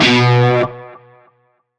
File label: Shawzin Oct One B; Warframe; Narmer Shawzin